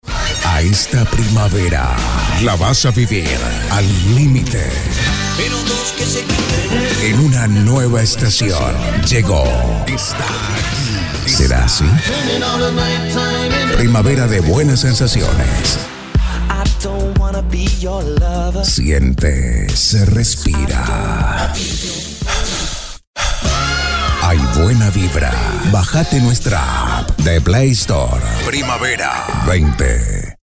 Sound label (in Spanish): Primavera RockPop